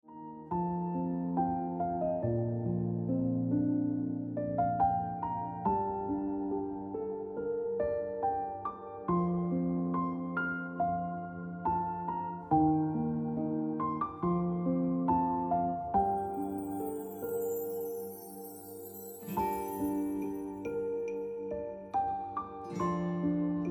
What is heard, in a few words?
Piano Music